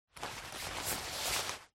foley jacket

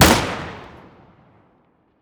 elites, weapons; Counter Strike Model Pack; CS2

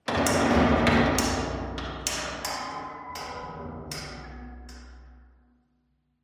pipe bang, metal; Half Life Alyx

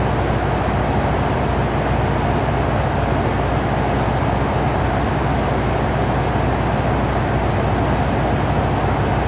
interior, ambient; nuke

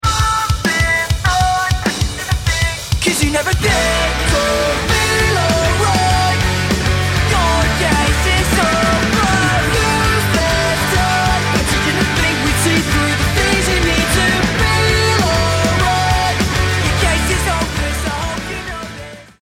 start action, music

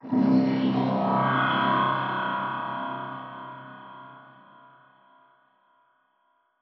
strider distant; Half Life Alyx; combine; c17

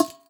vent claw, headcrab classic; headcrab classic, scripted; creatures

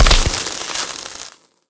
spark sparkles

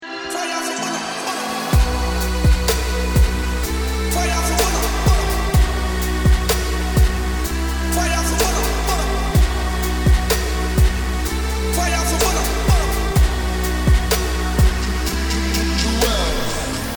start round, music

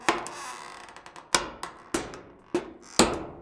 metal stress; Counter Strike Model Pack; CS:GO; survival

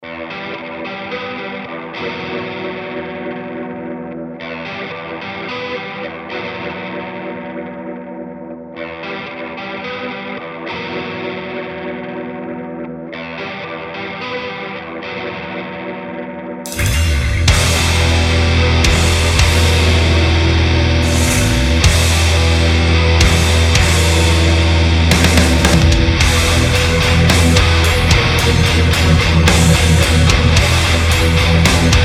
main menu, music

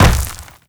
land, footsteps, player, dirt

